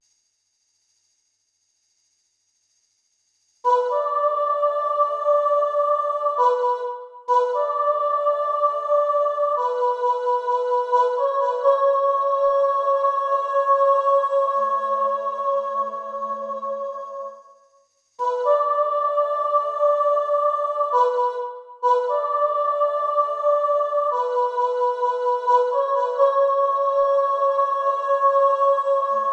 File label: soprano 1 emphasized; Soprano 2